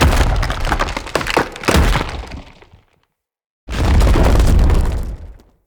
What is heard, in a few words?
Rock Throw Ability; Bromius; Abilities